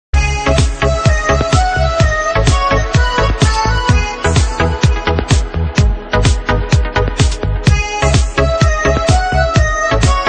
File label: Dance Party